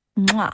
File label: Kiss